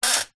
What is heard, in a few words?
household bed bunk metal framed movement creak loose single; zapsplat